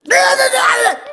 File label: raving rabbids